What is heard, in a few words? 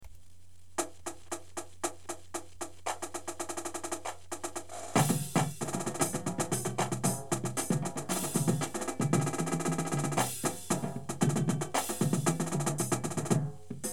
full drumline